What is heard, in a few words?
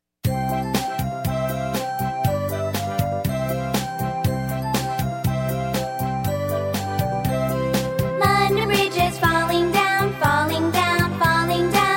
London Bridge